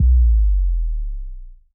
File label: 137BPM Sub Fx; Sound FX; Amajor